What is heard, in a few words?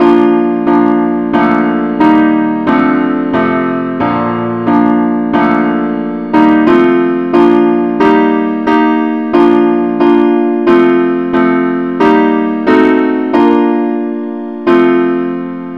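advance accompaniment key b flat; christe Sanctorum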